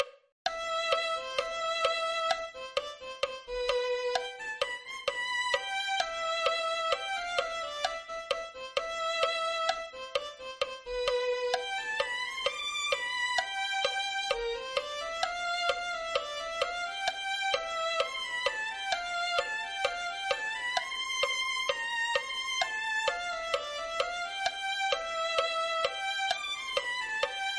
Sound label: violin